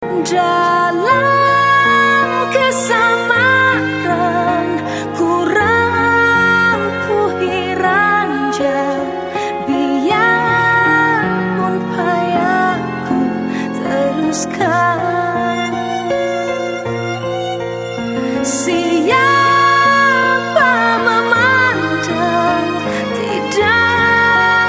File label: chorus